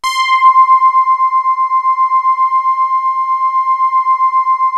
FAT SAWZ; MORPHEUS

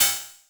closed Hat, Yamaha FS1R

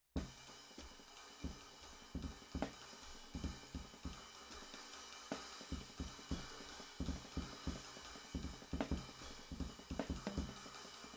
OH RIDE, drum n bass; RAW MULTITRACKS

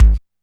Kick, Alesis DM5

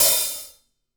DW Red Maple Hi-Hat Open Stereo; 2002 DW Red Maple Kit; One-Shots; Sonic Foundry (Sony Creative Software); Vital Drums

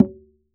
Congas; Drums Percussion; E-MU Producer Series Vol. 4; Denny Jaeger Private

